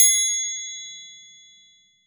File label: triangle; TRIANGLE2